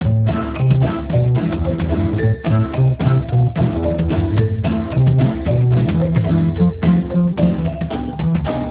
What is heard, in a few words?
music; audiocodecs; spx